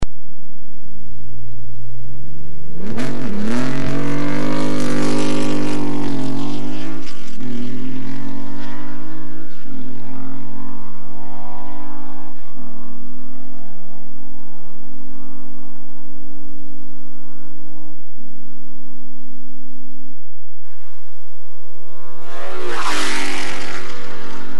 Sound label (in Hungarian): Maserati 250f, motorhangok; autosport